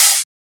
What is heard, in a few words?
Open Hat; FLPRO